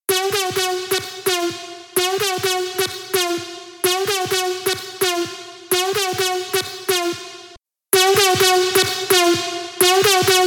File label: Drop Lead